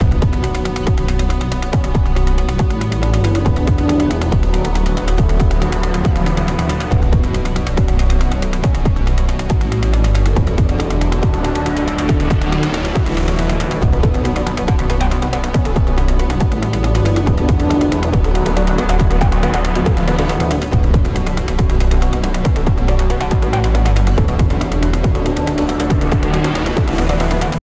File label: Boss Loop